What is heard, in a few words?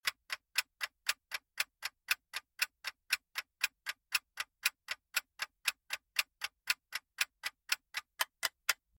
clock ticking down